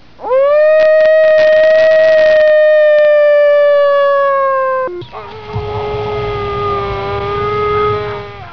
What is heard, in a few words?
howling